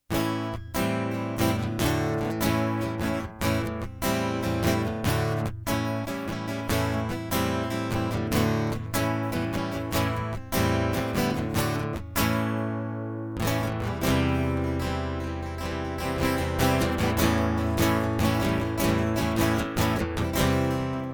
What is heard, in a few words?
Guitar